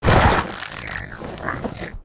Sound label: die; Fitton